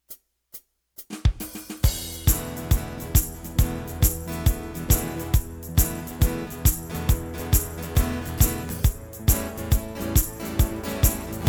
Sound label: Rainy Day Instrumental Alt